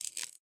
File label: arachnid chitter; Voice